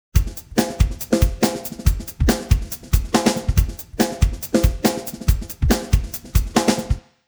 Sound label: drums; serato pitch n time le; timestretch